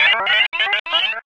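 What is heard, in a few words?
sphere blips large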